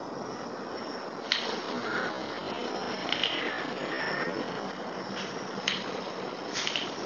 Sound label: Sound Slowed Down; Robeline residence